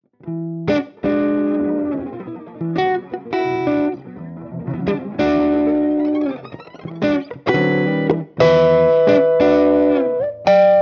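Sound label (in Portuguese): blues em mixolidio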